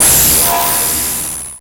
Transition Feedback Distorted; Adobe; Production Element

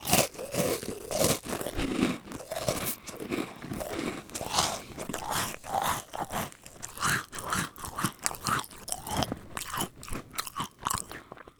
Human Male Chews Food Crunchy Mouth Open

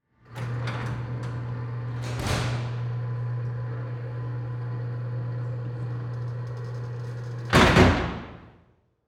Emergency Door Close Recreation Area; emergency effects; AdobeCC

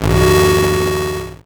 Title Transition Distortion; Production Element Title Transition

